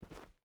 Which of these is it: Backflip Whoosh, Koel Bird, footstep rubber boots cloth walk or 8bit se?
footstep rubber boots cloth walk